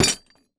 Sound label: Impact Table Fits Hit Table With Ceramic Pieces On It